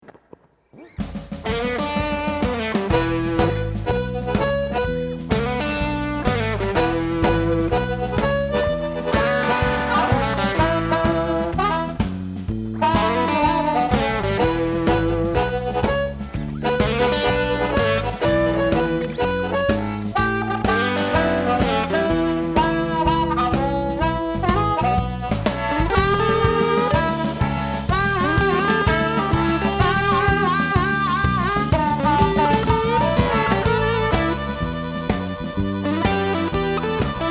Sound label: clean, bass